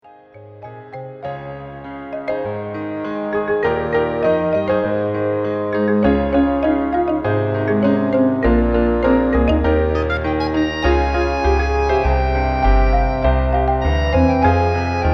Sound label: Instrumental